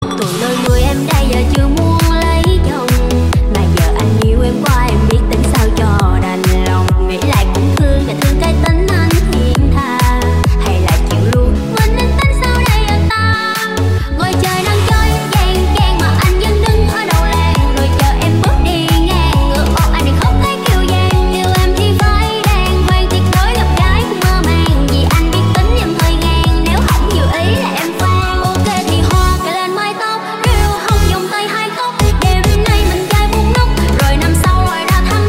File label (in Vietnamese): nhac chuong remix